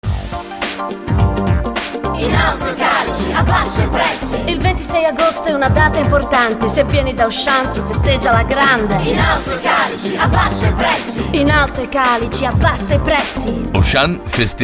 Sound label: rap; auchan